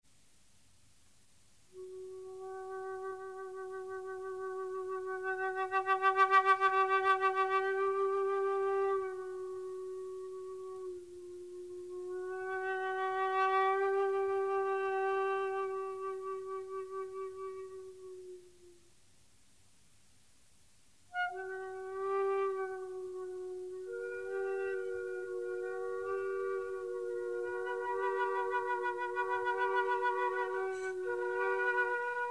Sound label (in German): Suite fuer drei Floeteninstrumente, Intermezzo; 4. Satz